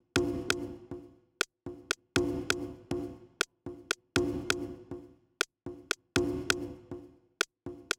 Loop 120, Perc Loops; Equipped Music; Loungin House

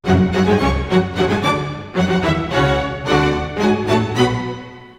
110 strings, F#min, Orchestral arrangements; PowerFX - Downtown Orchestra

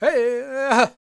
powwow, World Voices, Lakota

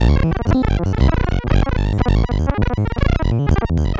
Random, LFO & Noise

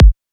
synth kick

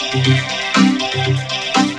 VOCODER DRUM

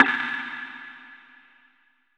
hit noise; AKAI S6000 CD-ROM - Volume 5

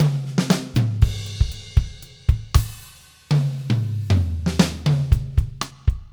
Fills 117; Zero-G; Monster Beats

